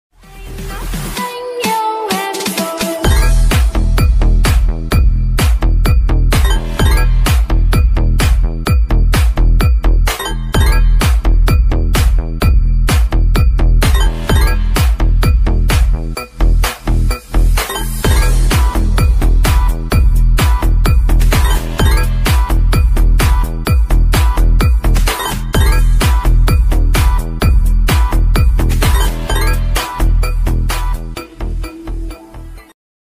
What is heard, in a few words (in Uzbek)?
gudok music, chaqiruvga zor musiqa